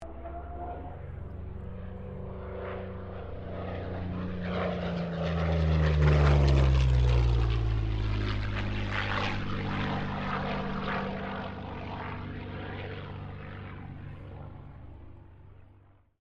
AIRCRAFT P-40 KITTYHAWK PASS STEREO

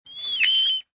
Spider Monkey Howl; Monkeys; Animals

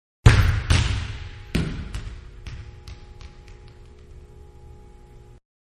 Volleyball Indoors-Slam; Miscellaneous Sports; Manhattan Production Music

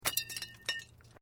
Metal Light Movement Minor

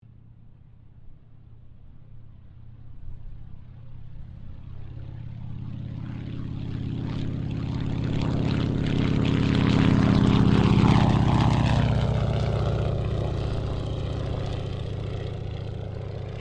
AIRCRAFT BOEING PT-17 STEARMAN TAKEOFF; PT-17 Stearman Trainer; Airplanes

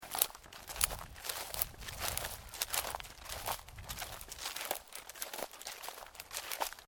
Shoes: sneakers, walking on dry wheat; Footstep Sound Effects; Footsteps (Sound Effects:Human); Stuart Duffield

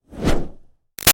Fire Torch Whoosh Med; Flame Sound Effects; Objects

